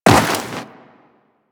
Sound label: Walk Snow, Footsteps; Warframe